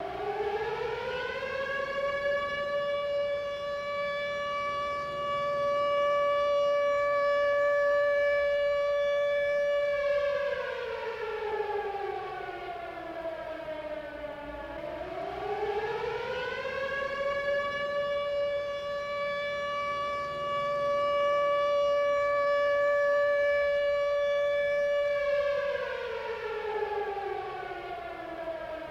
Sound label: Sirens Air Raid Alert Ext Dis; Soundstorm 5